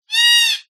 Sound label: animals bird cockatoo black squawk slight distance